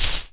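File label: dirt footstep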